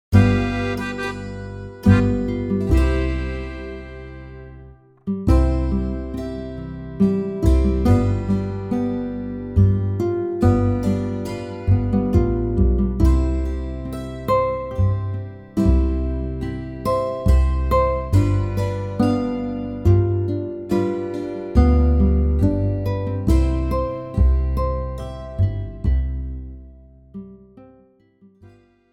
Jodler, Floetentoene; Lassinger Eisschuetzen-Jodler; Jede Menge Floetentoene 1

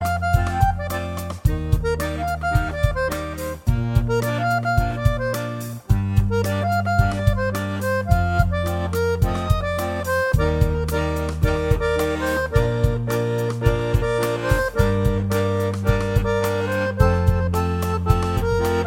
Akkordeon; Akkordeon gefaellt mir 2

